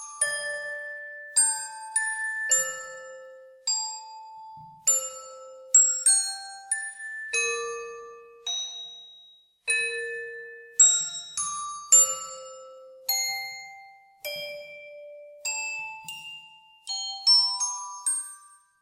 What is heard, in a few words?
Music Box Shortened